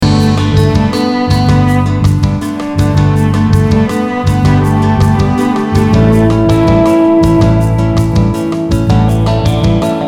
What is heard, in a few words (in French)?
Guitare rythmique et General, Guitare rythmique dans l’arrangement